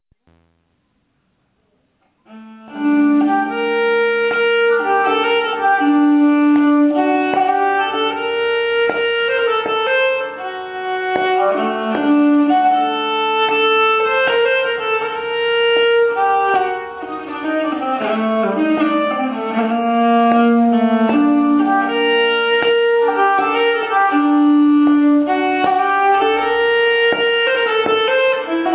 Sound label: Silverpolskan, nyckelharpa